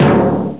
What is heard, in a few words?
drums timpani; Atari Mega Archive